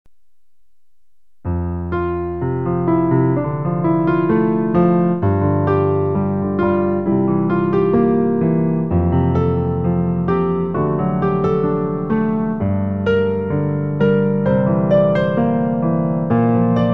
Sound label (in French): piano